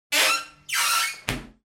DOOR SHOWER

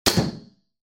ARCHERY

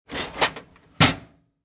TOOLBOX

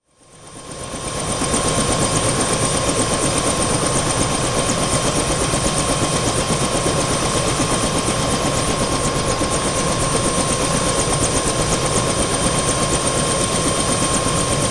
HELICOPTER

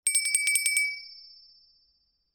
BELL DINNER

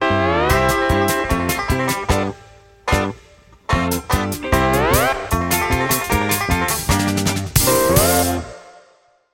TV Toons

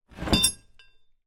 Metal Hitch Wood Pull Up

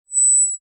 ELECTRONIC FEEDBACK

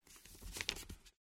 paper page turn

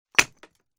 Brick Drop Impact On Concrete

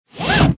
Auto Seatbelt Compact Interior

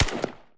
ak47

